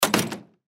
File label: Hangar Door Close Small; Transportation Aircraft; Airport; Airports